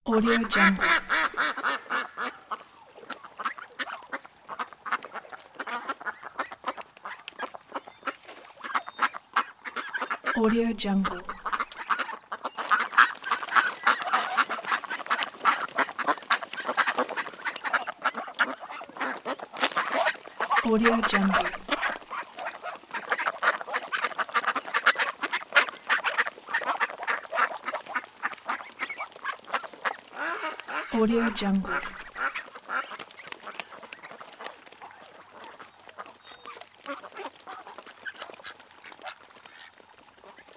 ducks

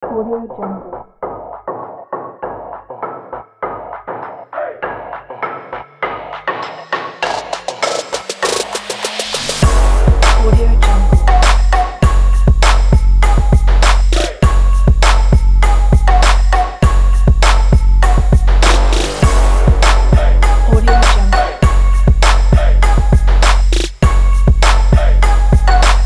twerk

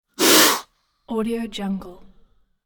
snake king cobra hiss; hollywoodedge

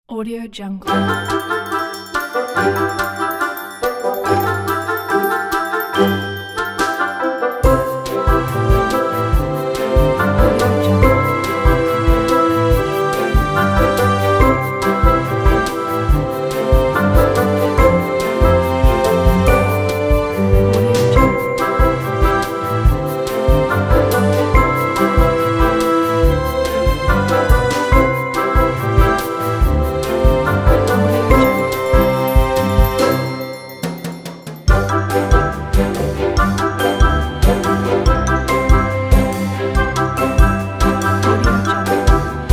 cartoon character school

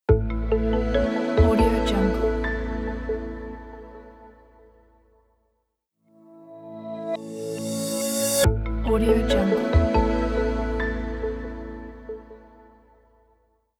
upbeat logo

